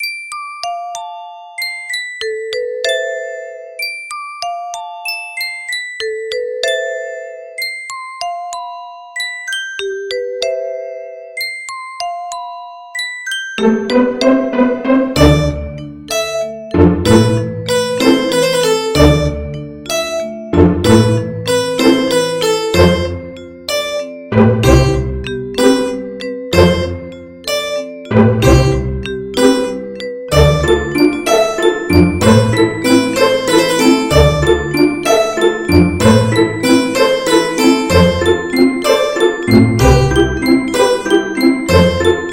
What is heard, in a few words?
orchestral